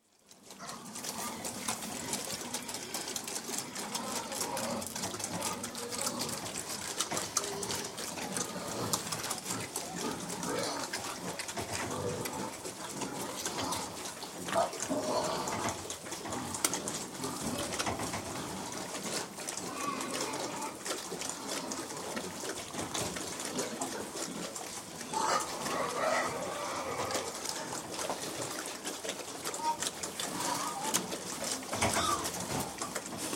Pigs, interior, general atmosphere of approx 20 pigs feeding, very active with some loud squeals; BBC